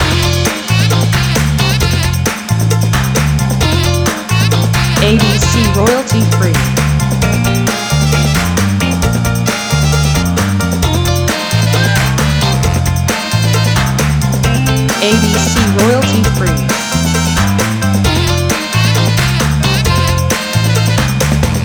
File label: Soul Party loop